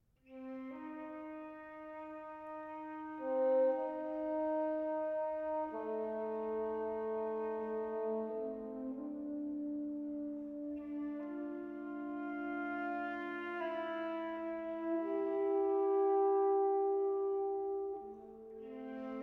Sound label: Aria With Oboe, Wind Quintet